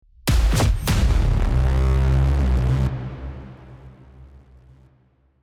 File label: braam